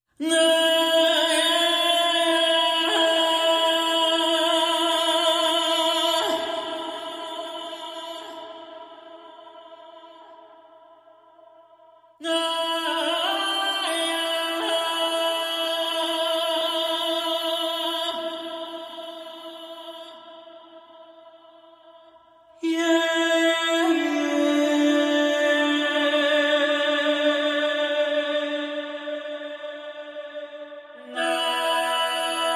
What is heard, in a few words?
Vocal Only